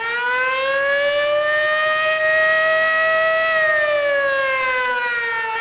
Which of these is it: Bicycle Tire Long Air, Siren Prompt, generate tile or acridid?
Siren Prompt